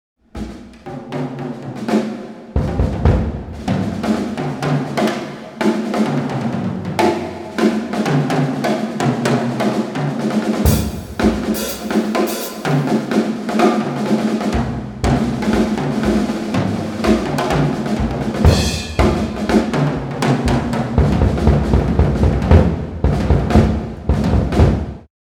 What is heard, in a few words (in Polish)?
perkusja